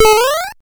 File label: Sound Effects Small Mario Jump; Super Mario Bros.; Super Mario History 1985-2010